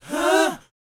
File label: BOYZ, Vocal, R&B Dance